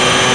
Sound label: speed idle